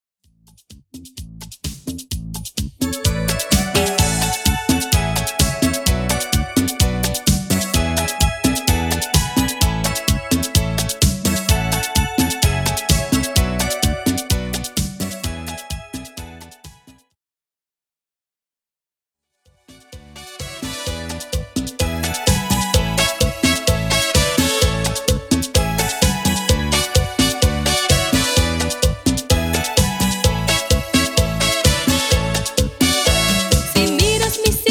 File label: Intro 128 BPM